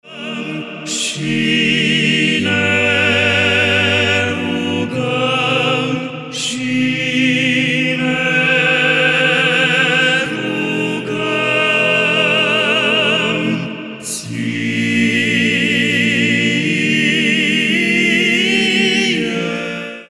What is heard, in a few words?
Voice Quartet; Pre Tine Te Laudam; Gavril Lamakin; florilegiu